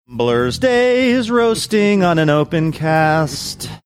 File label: singing blursdays roasting on an open cast